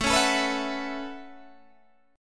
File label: A 6th Add 9th A down Guitar DADGAD